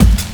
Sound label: Kick, One Shot Drums